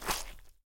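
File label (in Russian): плескание водой друг в друга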